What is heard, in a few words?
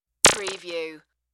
Squiggle